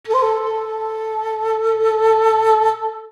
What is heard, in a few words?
Heroic Shakuhachi shot